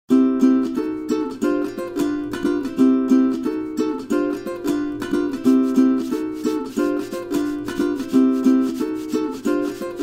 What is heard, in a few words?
Ukulele